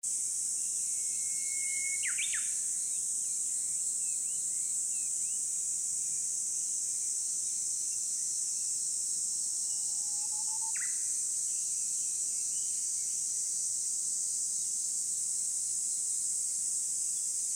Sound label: morning countryside